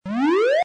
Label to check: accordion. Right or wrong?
Wrong